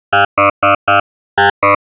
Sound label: shepard with context down